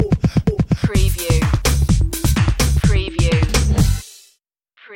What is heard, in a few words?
chandrajam sting